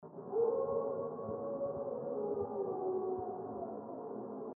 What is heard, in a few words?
filtered howl; south bluff